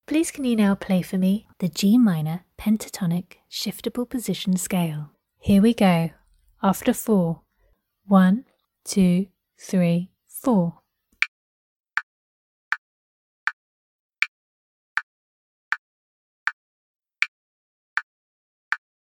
g minor pent shift

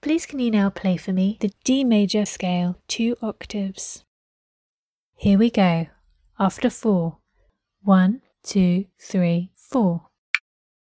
d major 2 oct scale

